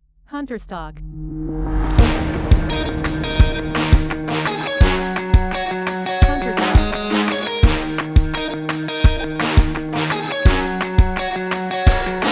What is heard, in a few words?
funk groove